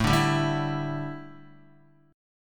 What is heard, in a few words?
A 7th Suspended 4th Guitar, chord; A7sus4; strummed; JGuitar